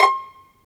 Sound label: STR PIZ VL, String Sections, Violins Pizz; Roland LCDP13